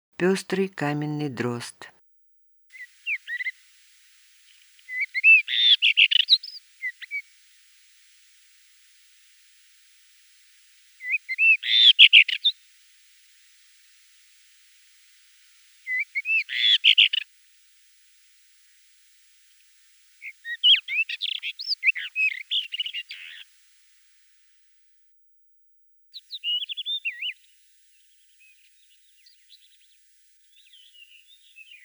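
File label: pestryy kamennyy drozd golos, rufous-tailed rock thrush voice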